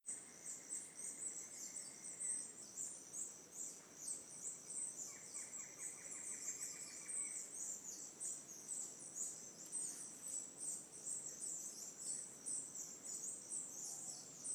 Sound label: manuel antonio birds